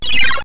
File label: meow; alleycat